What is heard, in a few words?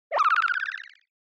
Motion Pop